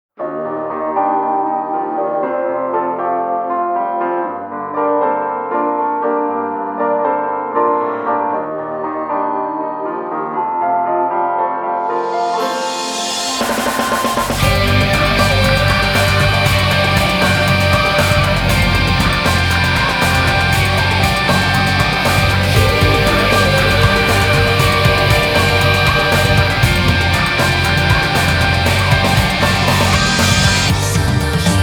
off vocal; Higurashi no Naku Koro ni Matsuri

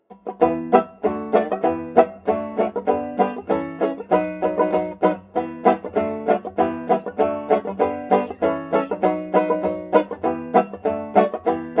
Guitar; Assassin's Creed Syndicate

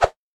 Panel Shower Hammer Swing, sound effects; Among Us

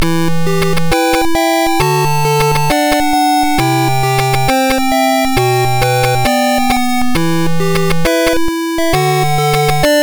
Lose Theme; Battleship; Game Gear

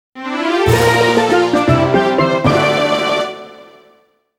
Mini-Game Victory

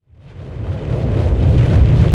black hole; guns n zombies 2014 pc

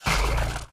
Portal; peace death 2017 pc

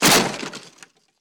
Break Wood; Boomerang Fu

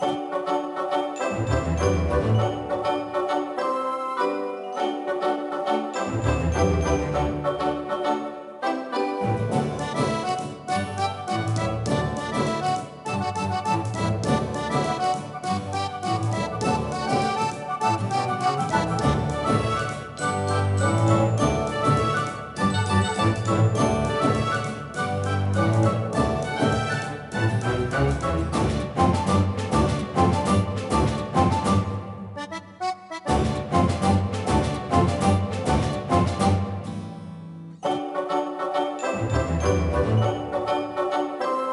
Mini Game Upbeat; Tangled 2010 NDS gamerip

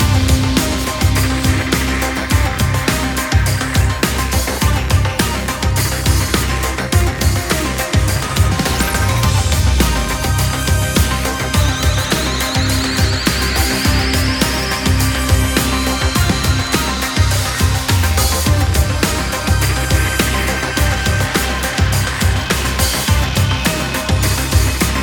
Loading Loop; F1 2015

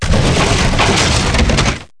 break heavy Wood

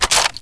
player pickup Gun

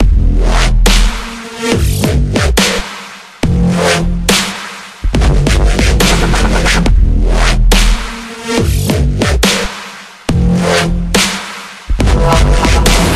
Space Background; Pixel Gun 3D

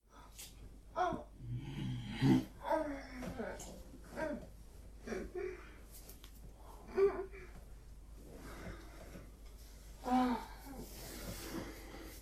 Sex Sounds

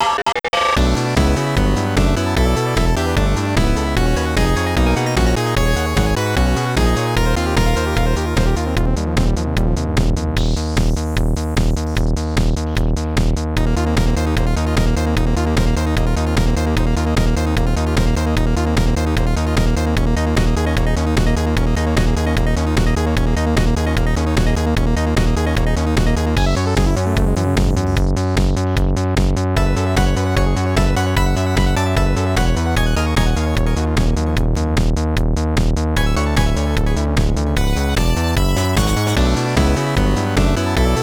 Warp Tunnel; X-Scape; 3D Space Tank; DSiWare; Nintendo DS

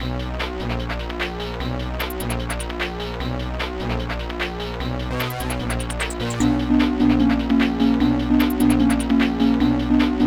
race; Airace; DSiWare

